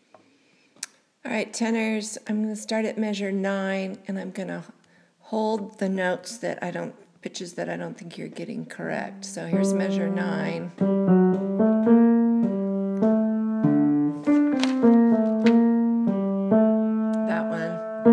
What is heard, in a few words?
allegro tenor